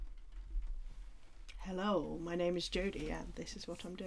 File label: penny sound effects